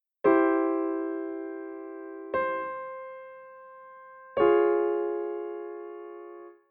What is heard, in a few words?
Half step modulation